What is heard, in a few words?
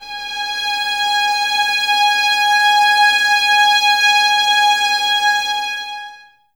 violins G#5, string ensembles; Miroslav Vitous; 11 Violins